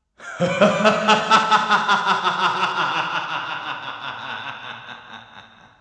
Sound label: LAUGH